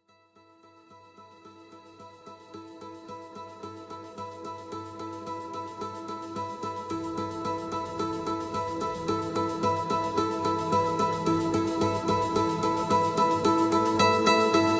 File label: epic day alarm ringtone